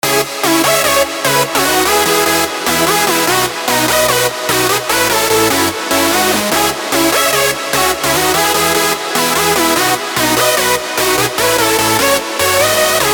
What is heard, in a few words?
Modern Lead, Modern Hands Up and Hardstyle leads; power, width, and ability to cut through the mix; CESA SAMPLES; DJ THT Modern Lead 2